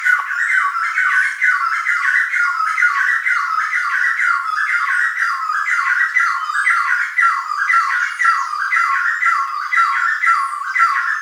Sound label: Chestnut Wood Quail